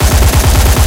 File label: vya23 loop right, guns; War Thunder